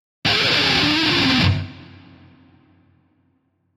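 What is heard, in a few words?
Electric Guitar Plectrum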